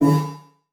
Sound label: Multimedia Internet CD-Rom Flash Ding